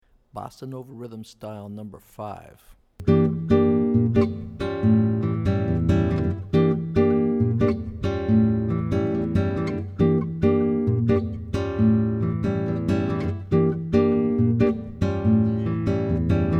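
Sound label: Bossa Rhythm Style, guitar; jazz chording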